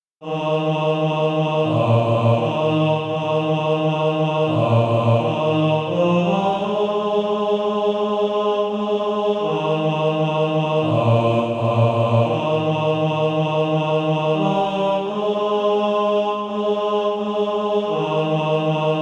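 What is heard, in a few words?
bas, Practise Music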